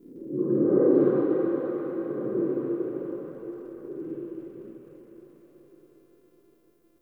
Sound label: Texture Interior Small; Sentient Lair; Ambience; Warframe